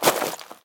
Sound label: ledge step; Golden Crayon Sounds